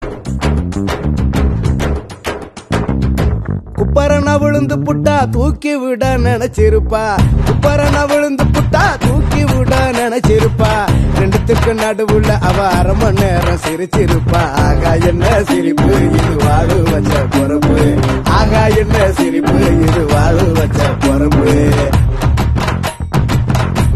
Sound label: tamil love ringtone